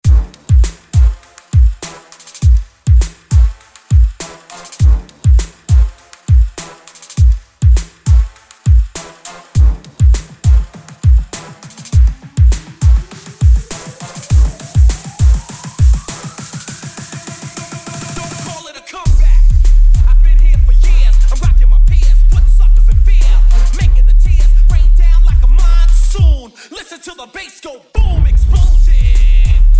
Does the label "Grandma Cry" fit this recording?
No